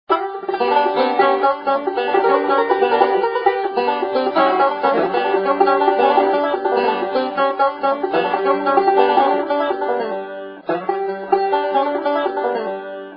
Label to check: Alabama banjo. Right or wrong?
Right